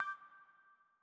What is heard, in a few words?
Classic UI SFX Short High; gameflow-deck